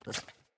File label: Puppy Idle Snort; Kubrow